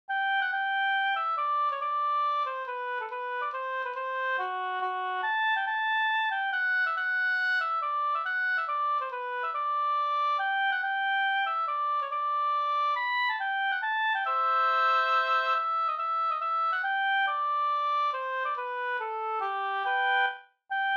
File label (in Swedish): hambopolska